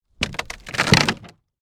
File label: Impact Wood Stress, Bend, Crack; Wood (Sound Effects:Materials); BlastWave